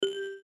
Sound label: Reel Stop; slingo-adventure